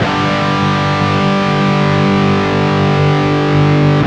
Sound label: G Chord, Guitars